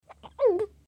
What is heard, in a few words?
gulp; pony; Twilight Sparkle